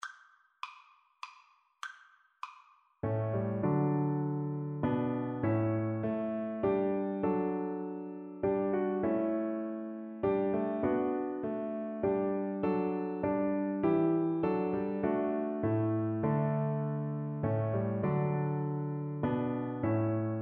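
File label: viola karaoke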